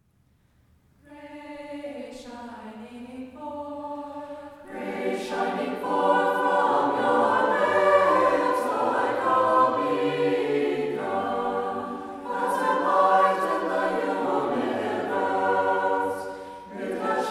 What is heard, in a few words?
Valaam Chant; Grace Shining Forth